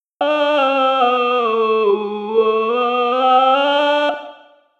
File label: alto